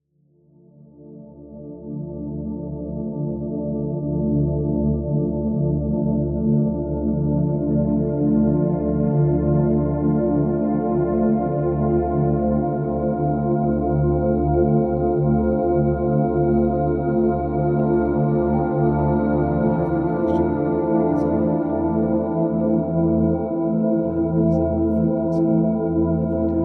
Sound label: Love Frequency Soundscapes; TwinFlameGuides